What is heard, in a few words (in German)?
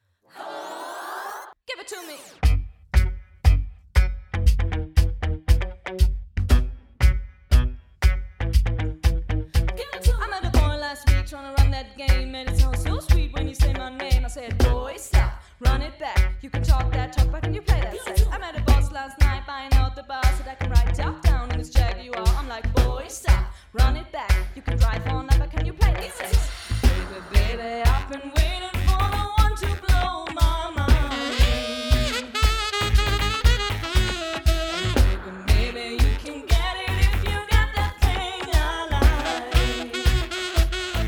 Partyband 4er Sax Modern Piano Drums Gesang Band Hochzeit; Hannover; Empfang